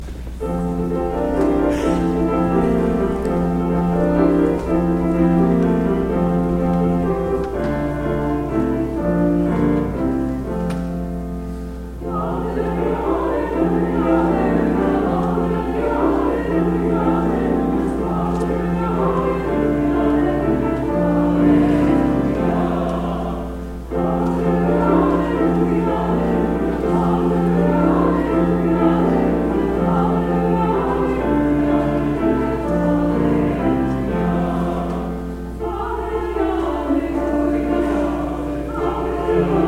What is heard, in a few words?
Processional Allelujah